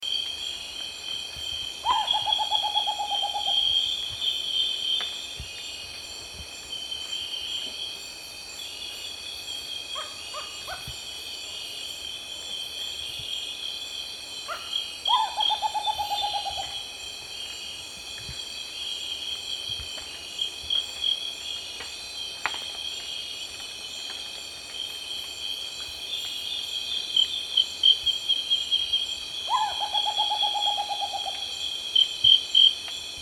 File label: Rufous potoo